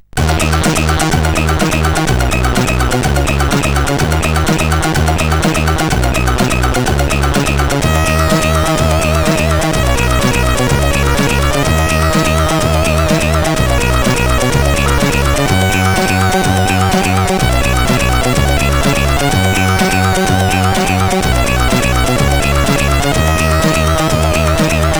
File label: sid CSG8580R5; Soulmates part 2; DEMOS